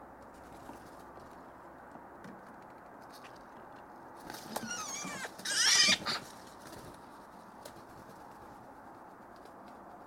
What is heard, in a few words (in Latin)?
Pica pica; SETE